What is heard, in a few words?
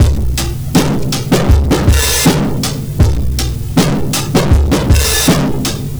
R&B Drums